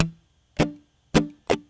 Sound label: Swing Pattern